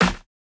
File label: snare, snare house